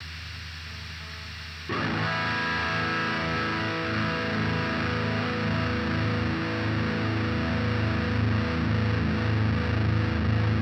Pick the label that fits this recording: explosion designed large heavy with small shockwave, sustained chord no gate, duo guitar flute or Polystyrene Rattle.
sustained chord no gate